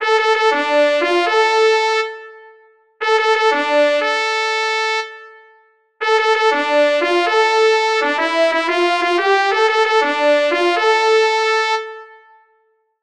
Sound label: fanfare